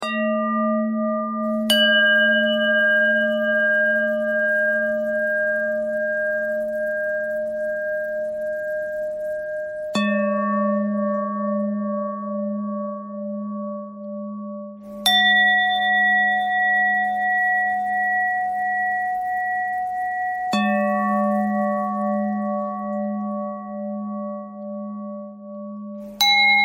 bell threads; bells

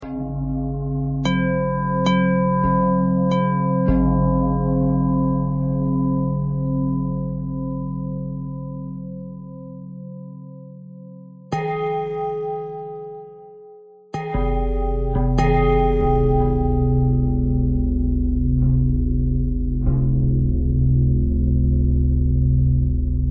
bell thread pentatonic

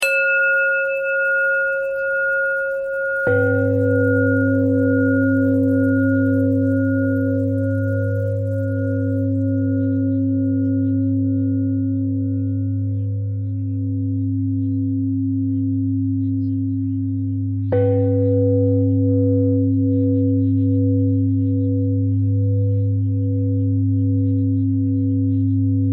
bell bell thread simple, bells

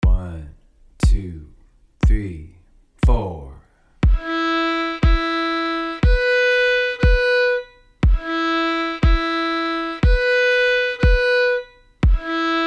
Intervals

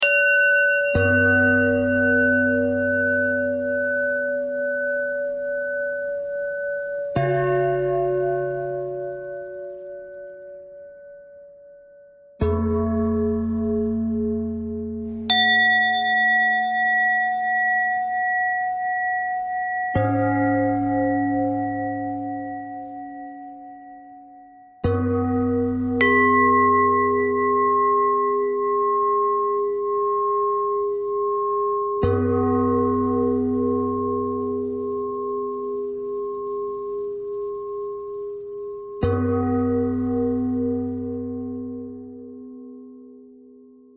bell bell thread noise

